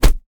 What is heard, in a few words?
Attack Blocked